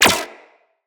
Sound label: Shield Impact Default; Warframes; Volt